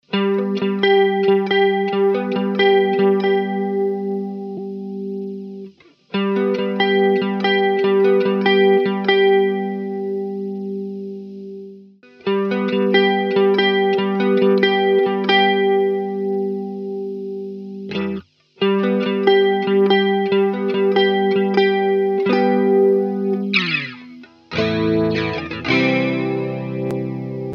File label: Dimension